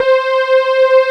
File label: SAW; Pro-Rec - Synthsonic